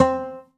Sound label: Yamaha TG500 FI Banjo C4